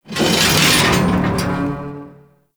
Sea Lab Hanging Chain; Warframe; The New War

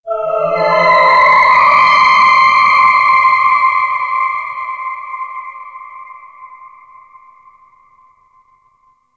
Space Exterior Dist Call, Ambience, Space Battles; Warframe

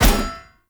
Sphere Polearm Medium Impact Armour Solid; Weapon Impacts; Player; Combat

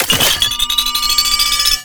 Heavy Orbital Strike Cast Charge Up; Abilities; Warframe; Tau; Dax Heavy

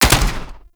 SMG Alt Shot; Warframe; Tenno; Sundial SMG; Weapons

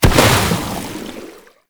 Bubblegun Pistol End Explosion

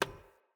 Foley Action Default Polearm Hand Pat; Player

